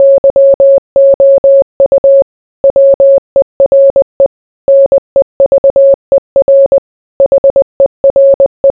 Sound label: morse